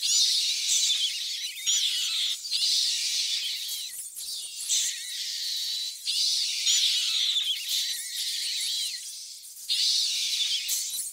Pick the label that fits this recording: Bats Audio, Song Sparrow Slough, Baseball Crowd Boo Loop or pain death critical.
Bats Audio